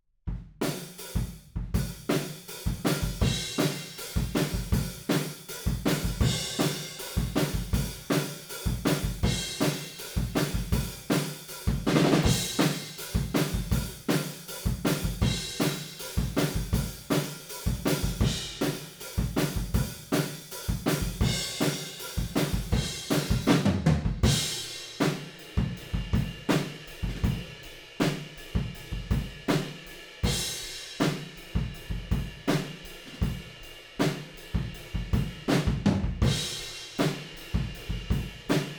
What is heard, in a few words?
Room, 160 BPM